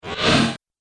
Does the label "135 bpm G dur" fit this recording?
No